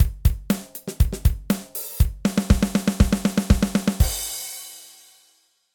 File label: Drums Loop Test